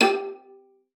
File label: G4, VIOLA FINGER; Xsample VOL-5